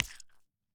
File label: Attack Landing Jelly